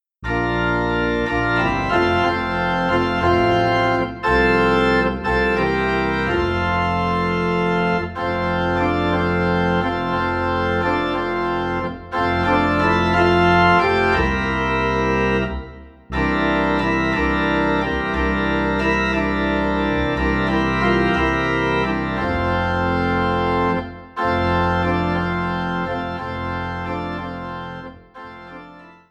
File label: Organ Low in F; Love Lifted Me